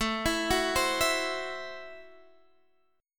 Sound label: A Minor 6th A Guitar Standard; Am6 arpeggiated; JGuitar